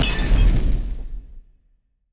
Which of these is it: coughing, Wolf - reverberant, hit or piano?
hit